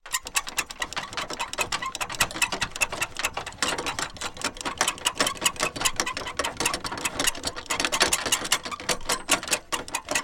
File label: Industry Tool Handcart Squeaks Long